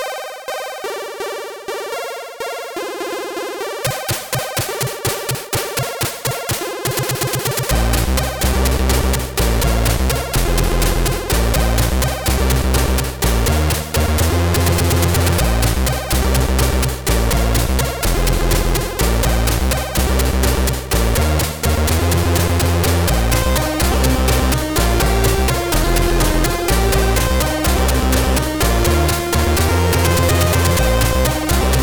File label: funky groove; AHX; Entity